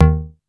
BASS SEQ. D#3; SEQ AND PERC; Music Store - Claudius Diemeter Schwerlos

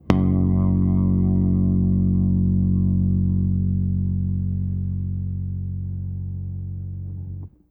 OCTIVER Gb1, bass; Sampleheads; Ultra Freakin' Bass

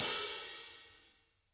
HTS OPEN

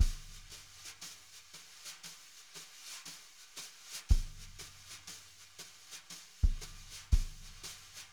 jazz brush loops

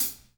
HATS, Drum Kits

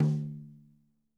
mid tom; drum

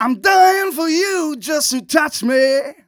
mel, Vocals; Mutekki Media - Diamond Vocals 2

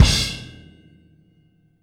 Boogaloo - Outro - Full Mix, 82 BPM; Sonic Foundry; Drums from the Big Room The Mixes

